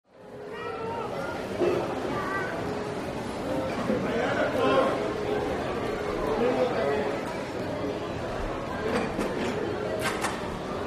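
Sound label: Mall Amb Light Steady, malls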